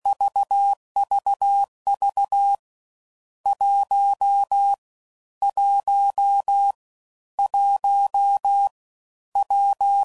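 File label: Numbers, CW Code Groups 15WPM; HamRadio; Morse Code; Mixed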